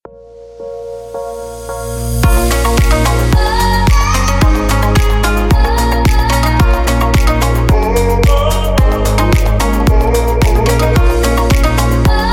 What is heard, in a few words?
electro